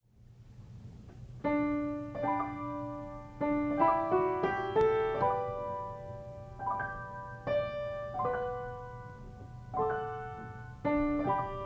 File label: Silent Movie Fairy Alt